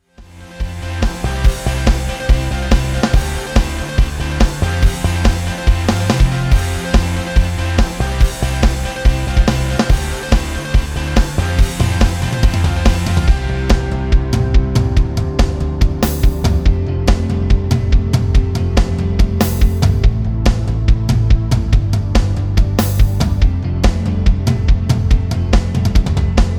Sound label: rhythm